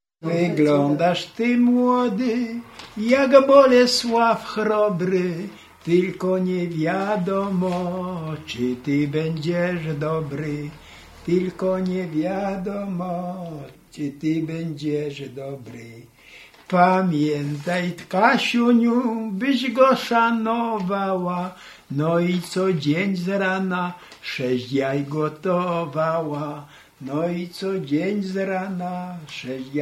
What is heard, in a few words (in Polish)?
przyśpiewka weselna